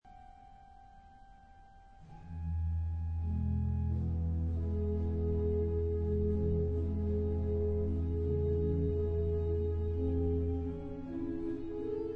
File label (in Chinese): 管風琴; 巴洛克時代的管風琴曲; Dandrieu; Nicolas Clerambault; HORTUS